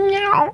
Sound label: meow; drdonut